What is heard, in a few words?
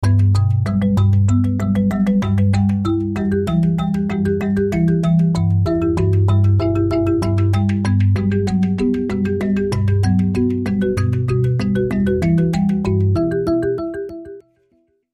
Mechanical Marimba; Sting